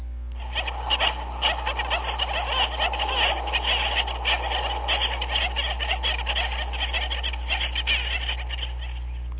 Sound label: Penguin Sounds